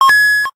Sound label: key